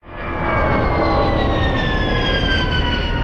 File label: samolot down